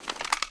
mag in, weapons; masada magpul

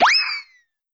pets food thrown

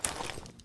g3sg1 cloth, weapons; svt40

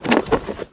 xm1014 deploy, weapons; browning a5

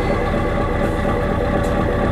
idle, vehicles truck

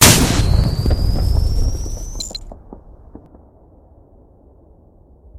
gauss shot

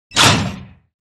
bear trap

